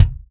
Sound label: Real Drum Kit, ROCK KIT